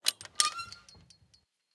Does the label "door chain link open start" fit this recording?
Yes